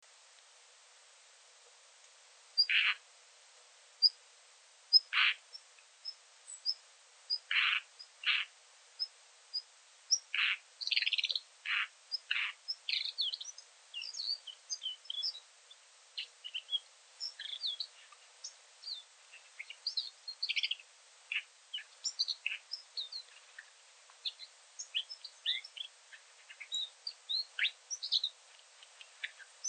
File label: redstart alashan call and subsong; border of the provinces of Ningxia and Inner Mongolia